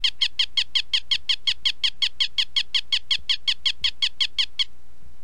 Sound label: bird